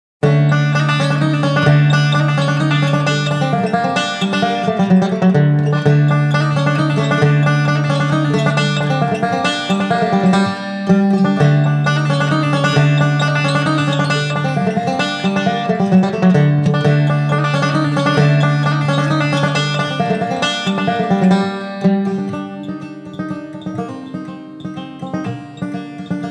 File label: polska, banjo; Eklunda